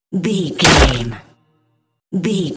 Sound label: dramatic hit bloody bone